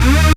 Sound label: synth, d#, Rave Synths; Best Service ProSamples vol.37 - Dance Synths